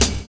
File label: 16 BEAT; Univers Sons